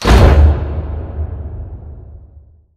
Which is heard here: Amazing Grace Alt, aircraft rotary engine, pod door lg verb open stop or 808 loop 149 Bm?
pod door lg verb open stop